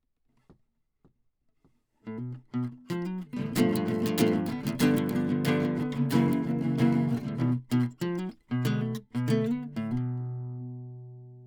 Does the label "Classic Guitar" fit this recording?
Yes